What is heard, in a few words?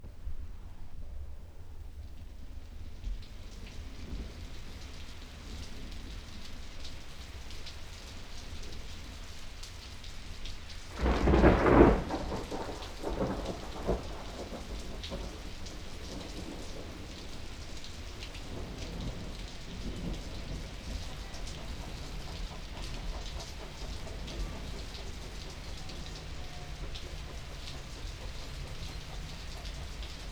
Steam Railroading Under Thundering Skies